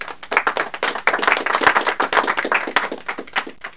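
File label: small clap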